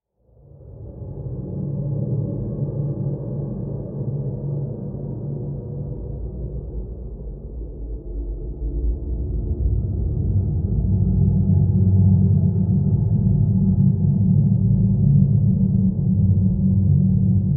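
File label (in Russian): в стиле хоррор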